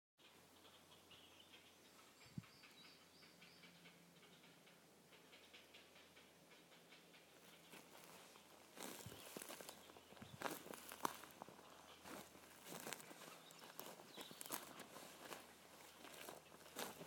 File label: chicks in trees